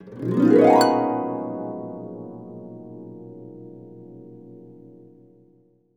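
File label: harp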